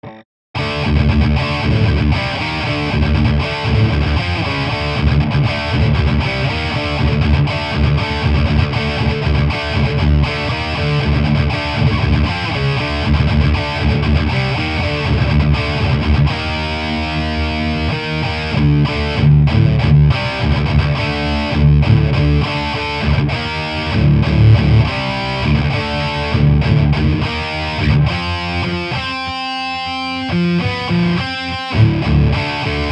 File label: drop d thrasher random; overdriven